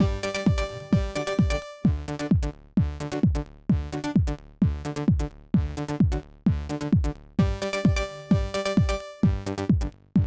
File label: skyscraper toys, BPM 130 / Key F; TinyBGM